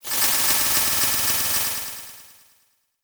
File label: Transition Delay Medium